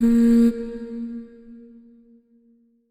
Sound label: Mother Hum A Sharp; Player Instrument; New Player Mother